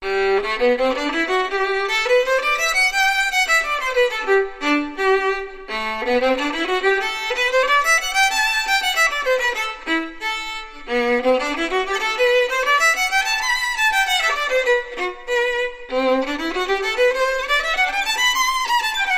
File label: Accomplished Violin Practice Scales